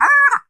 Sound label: Horn Funny Party